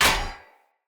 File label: Sphere Polearm Medium Impact Metal; Player; Environment